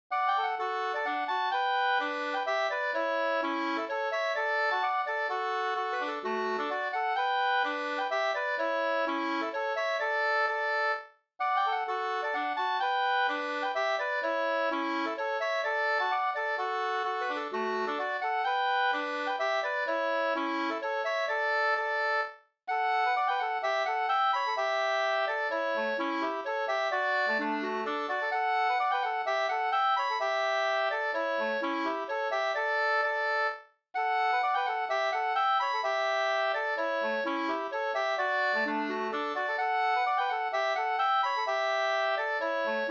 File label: mazurka; e Collin 2st